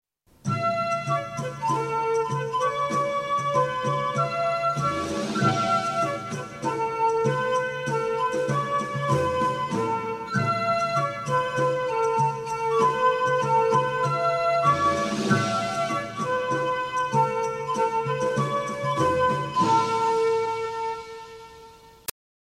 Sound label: MIDI; Hoerer mig